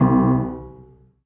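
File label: piano b octave; piano - b oktava0; gamerip 2007; You Are Empty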